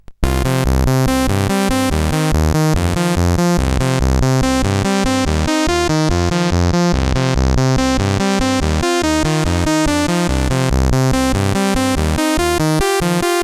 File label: loadertunes, sid CSG8580R5; Vicious SID 2